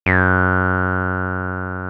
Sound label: TB 303